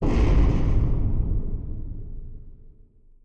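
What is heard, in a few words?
Collision Interior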